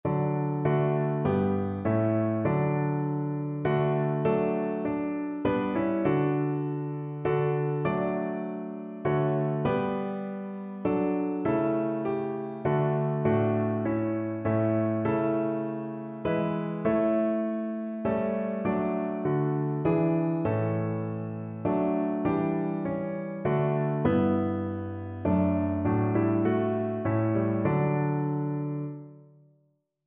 rockingham, piano

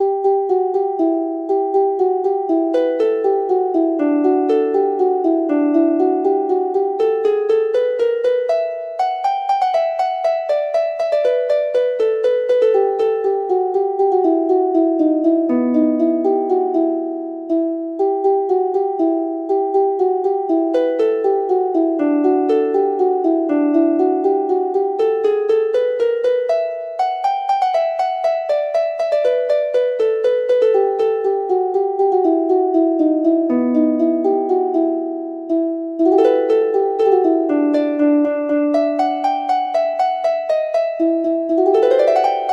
harp

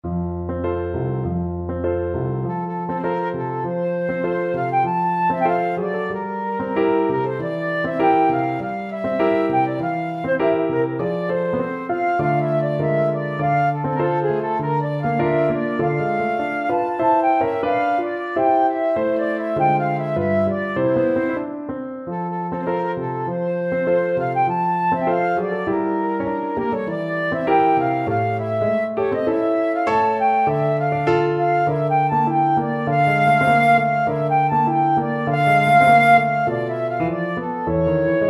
tango, flute

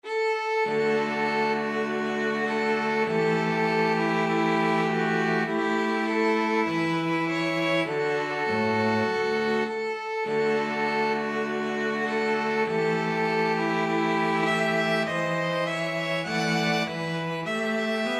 2 violins cello

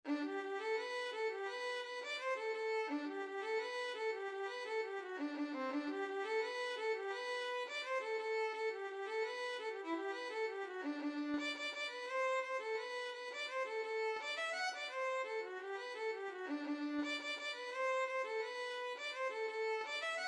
violin

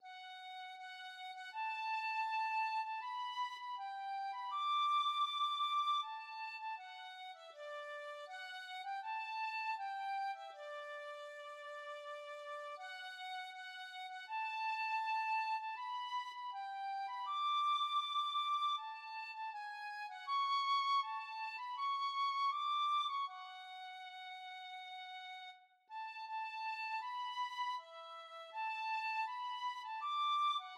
o holy night, tin whistle

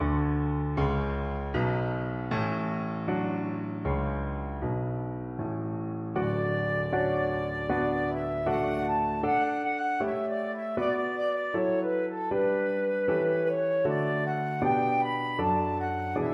concertino, flute

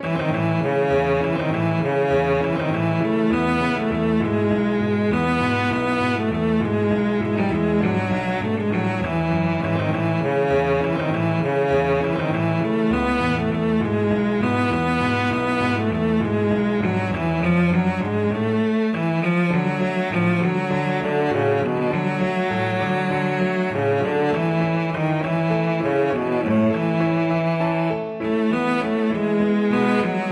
cello

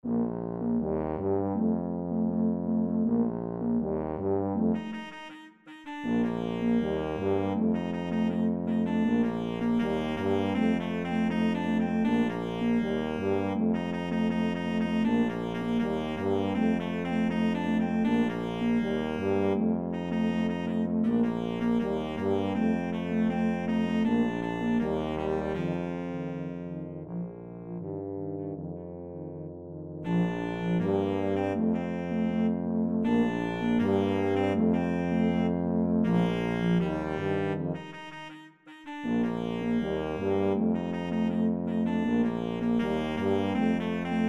la bamba, flexible mixed ensemble 3 players

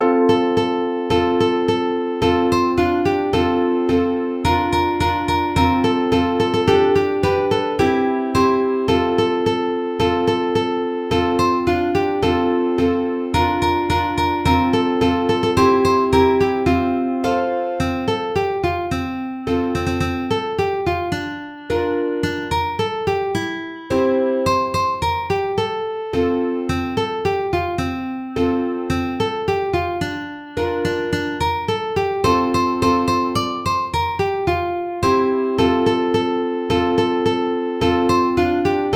Jingle Bells uke

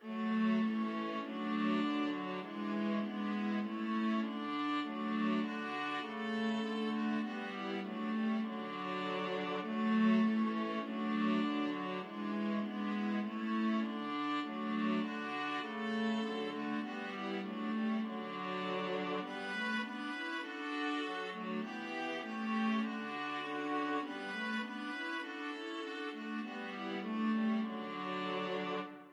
viola trio

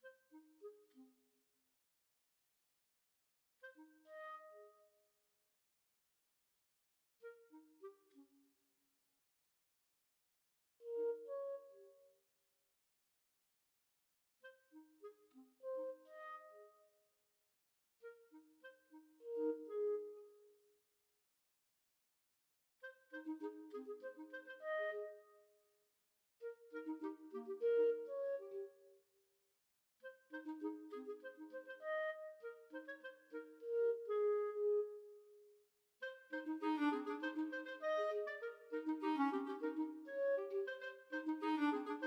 skip to my lou clarinet duet

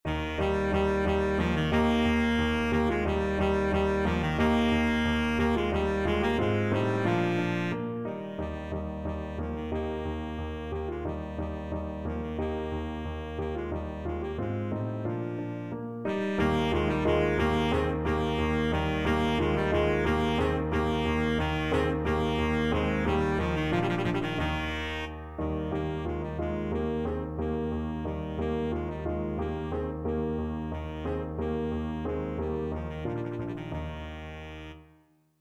baritone saxophone

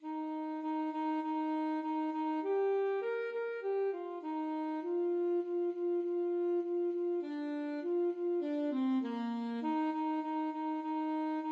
ASAX, saxophone

